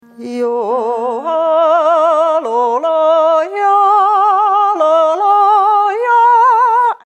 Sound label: sing yodel